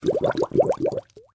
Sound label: bubble